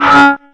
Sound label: OUCH HIT, METAL PERC; Zero-G Ambient 1